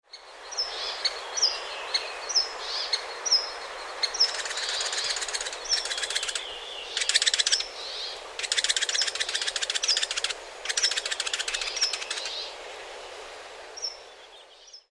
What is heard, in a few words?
lesser spotted woodpecker